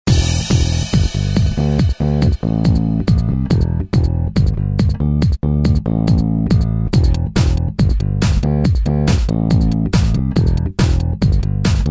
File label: FUNK ROCK inst